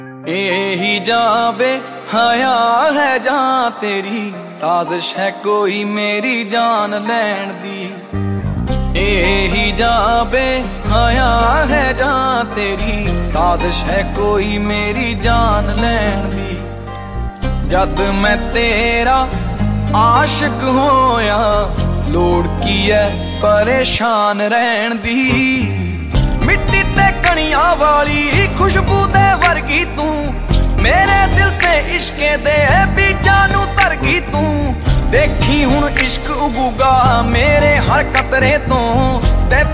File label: Song Ringtone